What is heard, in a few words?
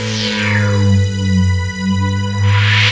Snares String, Jungle; FX; Blu Mar Ten - Jungle Jungle - 1989 to 1999 Samplepack